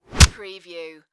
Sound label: Punch Body Hard